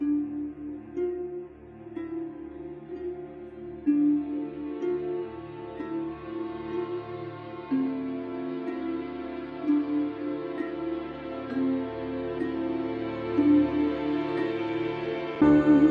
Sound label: strings, Sad